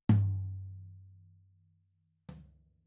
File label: Tom